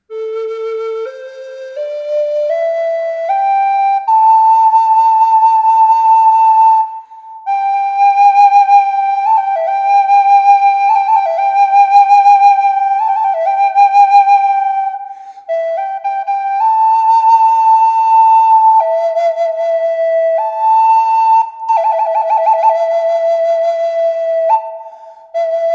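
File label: reverb, flute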